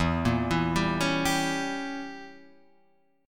E Diminished 7th, Guitar; Edim7 arpeggiated; JGuitar

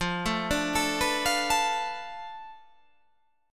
F 6th Flat 5th F down, chord

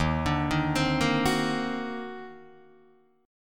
D sharp Minor Major 13th, Guitar, DADGAD; arpeggiated

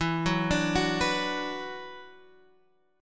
E 7th Suspended 2nd, down, Guitar, Standard; E7sus2; arpeggiated

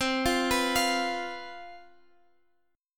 C Major 7th Suspended 4th, down

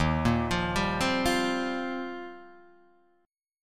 D sharp 6th Add 9th, Guitar, Open D

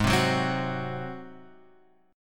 Ab 7th Ab down; Ab7 strummed; JGuitar

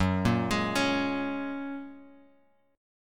F sharp Major Add 9th, Guitar Open G

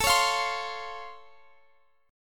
Db Augmented Major 7th, A

